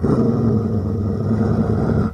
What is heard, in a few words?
wolf grunt; minecraft; technic